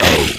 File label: monster grunt